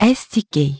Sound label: menu; Hyundai H-MP718